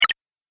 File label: Game Pause, video game